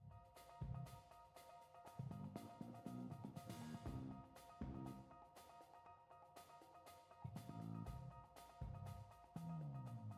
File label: Newsreport SFX; lego the incredibles 2018 pc; Cutscenes - Hubindustrial